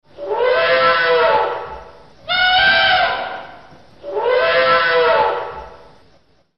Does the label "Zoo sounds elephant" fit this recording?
Yes